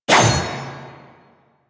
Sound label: Sword Whoosh; Warframe; Teshin; Weapons